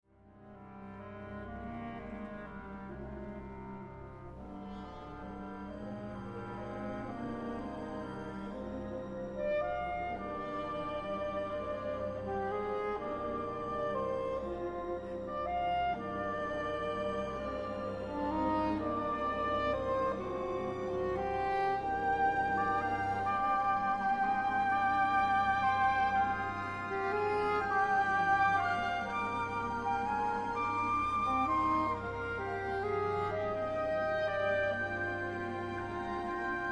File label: Saxophone Concerto; 2nd movt